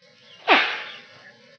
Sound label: blue monkey; sounds of the wild